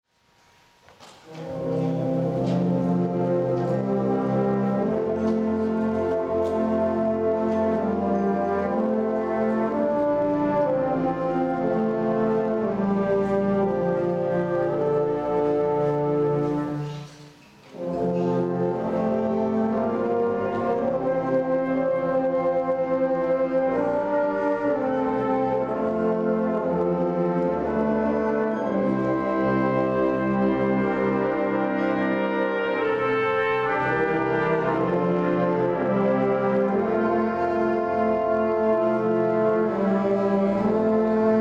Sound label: Irish Tune from County Derry, Wind Ensemble; ISU Concert Band Festival; LCHS Wind Ensemble